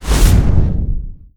cliff whoosh slow; Call of Duty Modern Warfare

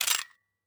clip out; Rust

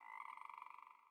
sting frog; Rust

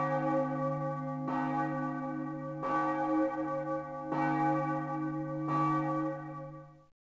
Church Bells; Ambient; Civ4 warlords

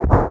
expl far start, explosion; World of Tanks Blitz

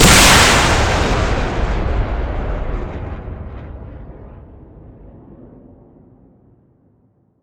Grad Firing; Weapons; DCS

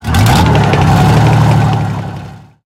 tank engine load; War Thunder

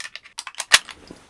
Inf Weapons Foley; Red Orchestra Ostfront 41-45

